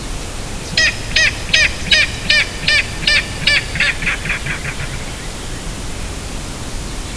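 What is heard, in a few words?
kek hurrah, King Rail; National Marsh Bird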